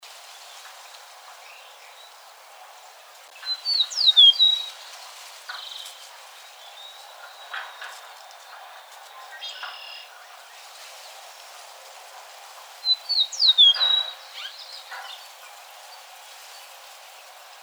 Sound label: Eastern Meadowlark